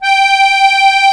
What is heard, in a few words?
DIATONISC, AKKORDEON